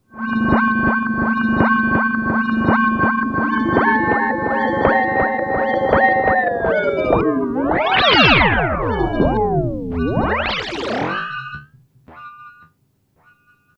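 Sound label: ring filter delay; blacet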